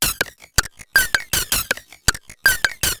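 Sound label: percussion, ambient, 160; eJay; Drum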